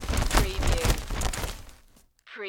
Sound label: Umbrella Shake Dry